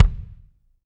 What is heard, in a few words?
BD; Bob Clearmountain Drums I